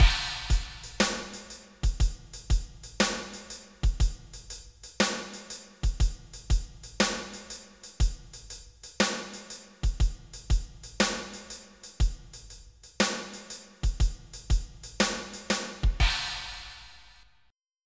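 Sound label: half shuffle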